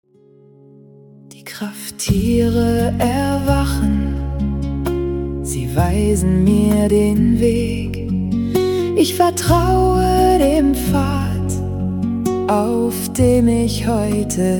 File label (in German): Mantra